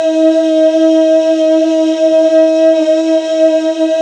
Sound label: VOI VOICE; Sound & Vision - Gigapack I CD 2